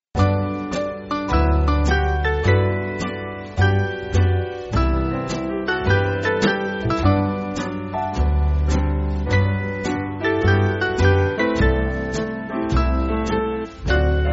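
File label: Band, Backing Files for Churches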